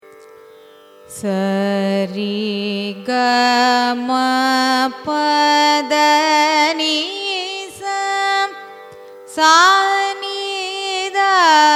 raga mayamalavagowlai arohanam avarohanam, carnatic music